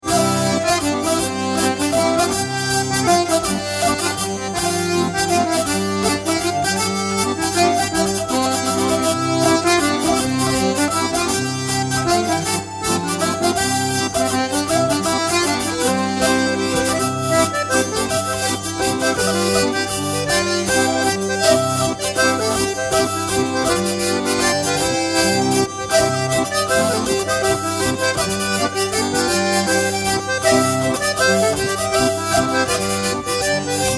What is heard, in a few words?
Mazurka; Mazurka de la mere Machin